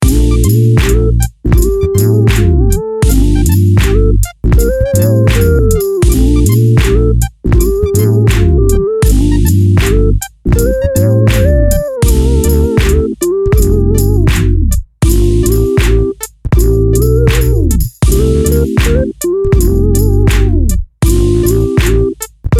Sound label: moog bass swagg